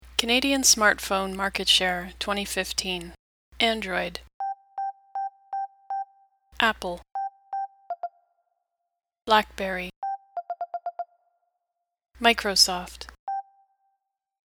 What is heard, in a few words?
sonification density zoom Units Key change